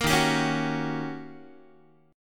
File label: Eb 7th Sharp 9th Eb, Guitar; Eb7#9 strummed; 13,11,10,11,10,x; JGuitar